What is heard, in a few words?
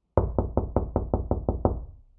door knock